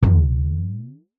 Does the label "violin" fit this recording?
No